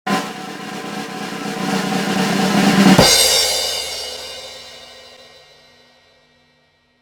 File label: Drum Roll Cymbal